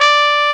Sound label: tpt D4; ClockWerx Demo Music